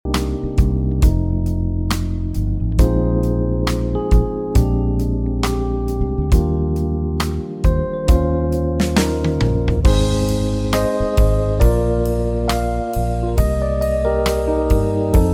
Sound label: bgm evening